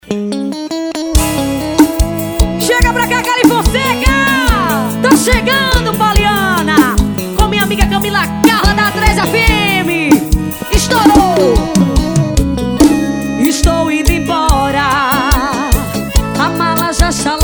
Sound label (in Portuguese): forró